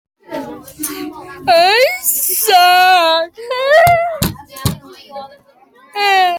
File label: whine boom